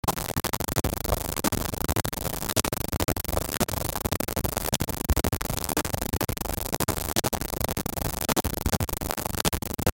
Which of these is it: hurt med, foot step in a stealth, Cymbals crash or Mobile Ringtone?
foot step in a stealth